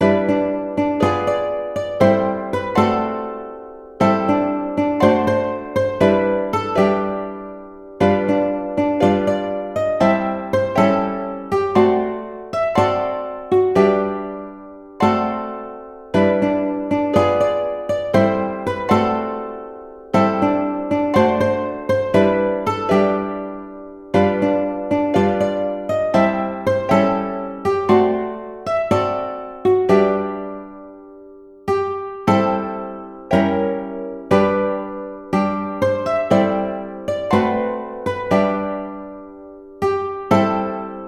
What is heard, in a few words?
Ukulele